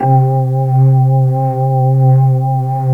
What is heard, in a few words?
Organ; GMTones